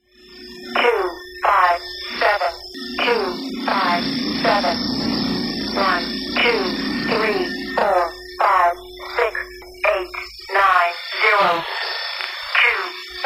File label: spaceship ringtone